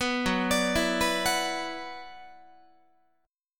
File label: F sharp 7th Suspended 4th Sharp 5th, Guitar